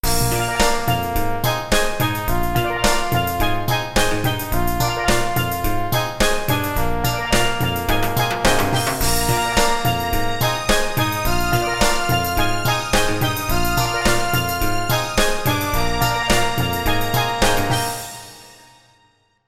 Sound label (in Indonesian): Nada Dering Nokia Jadul; Coconut